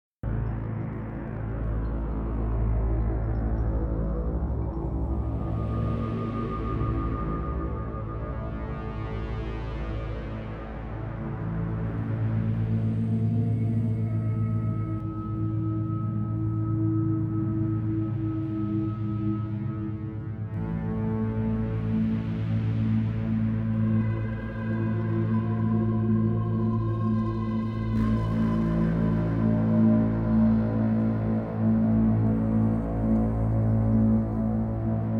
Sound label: pads and pulses